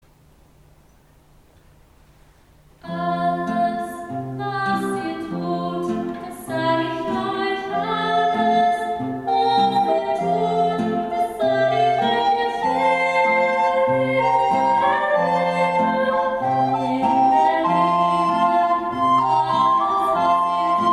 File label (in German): Alles was ihr tut Solo Flöten